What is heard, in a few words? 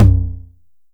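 909 low tom; Goldbaby Tape808 & Tape909; The Tape 909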